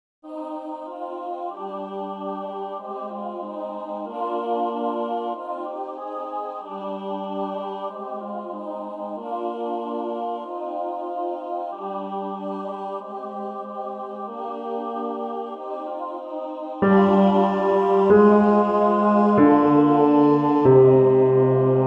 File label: Glory Be to God bass